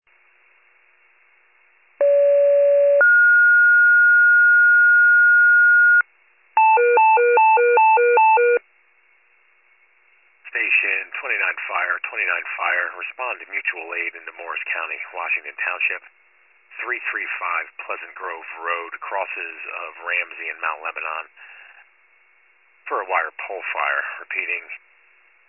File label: FIRE pager alert